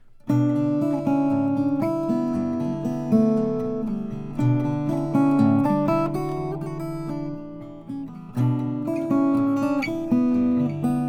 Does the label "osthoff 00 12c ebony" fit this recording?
Yes